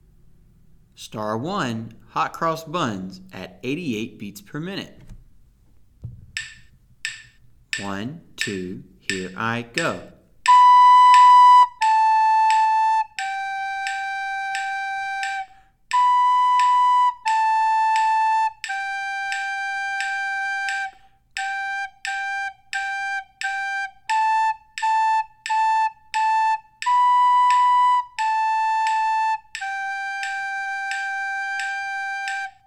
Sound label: 88 bpm, Recorder; Hot Cross Buns; Be A Recorder Star Songs